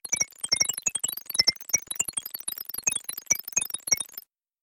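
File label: electronic sounds of data transmission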